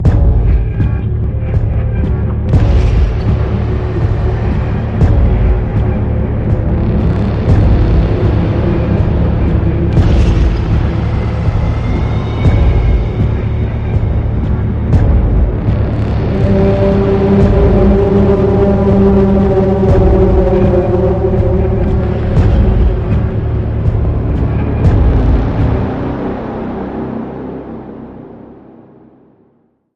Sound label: Infernal Horn